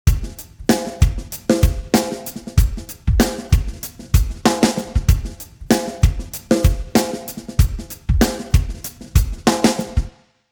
drums, percussive, time stretch; apple logic pro

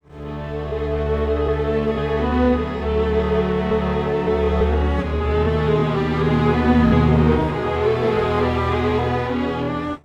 strings, time stretch; digidesign pro tools ts

